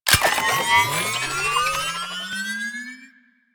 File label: Modular Secondary Handle Recharge, Guns; SU Modular Guns; Handles